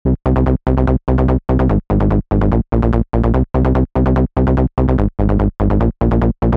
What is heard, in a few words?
Roll That Bass, eurodance 90s; eurodance 90s vol1; Preset Bank for ABX3: EuroDance 90s Revival